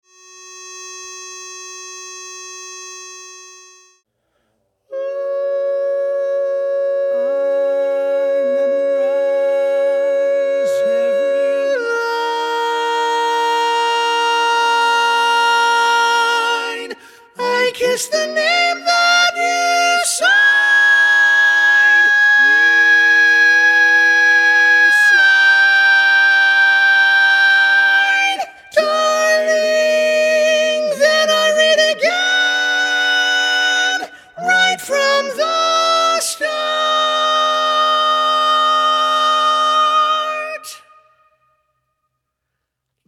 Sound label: Tenor Predominant